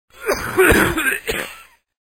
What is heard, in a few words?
cough